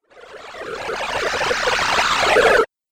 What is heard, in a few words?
Wacky Water Video Game